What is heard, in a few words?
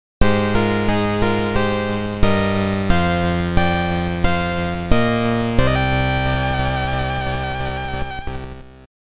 GAME OVER; Eschatos; silversword sound chronicle 2011